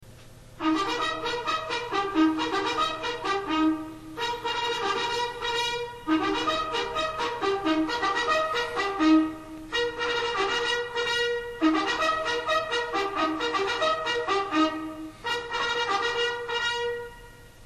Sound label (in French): Rappel pour honneurs, sonneries; Sonneries reglementaires; BIGORS MARSOUINS